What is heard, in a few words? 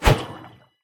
swing goad; smite; god ganesha skin11